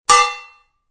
Sword Clank, Two swords clank together during sword fight.; Sword Sound Effects; Combat